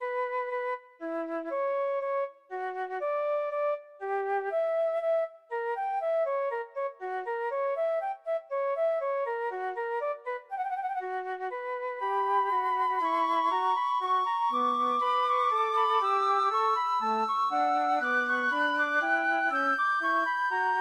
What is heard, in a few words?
flute trio; bach johann sebastian; as the rain snow fall from heaven for